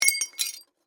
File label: Crash Glass Drop, Crash, Glass, Drop, Small, Piece, Debris; BlastWave